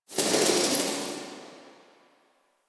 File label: Bats; Cave; Ambience; Warframe; Stalker Cave